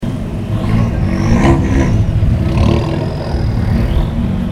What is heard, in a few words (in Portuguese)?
som motores